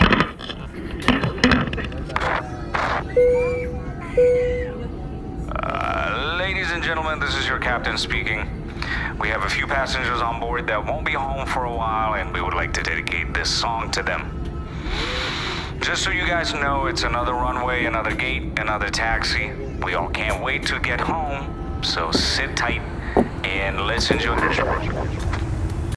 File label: Announcement (interlude)